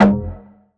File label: Bass F, Bass Synth